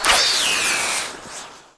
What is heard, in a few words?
flame arrow; AoM-ARTofMyth